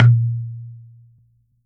coconut; Unique Instr

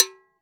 AFRO AGO, AFRIC AGO GO; AKAI S-Series CD-ROM Sound Library VOL-5

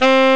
saxophone; Amiga Soundtracker Sample Packs

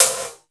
METAL S; Crypton - DrumBase5000; METALIC DRM2

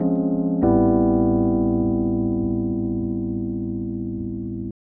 E.PIANO, KEYS

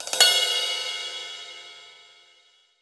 Crash Phrase, Roland BK-5 Cymbals

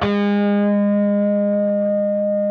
PRS A, Guitars, LEAD

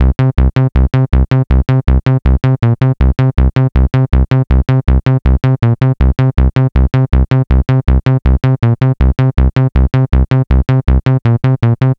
gro 160, Hardcore Loops, Bass Loops; Dance Synths

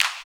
Clap; Equipped Music; Loungin House

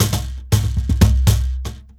DRM

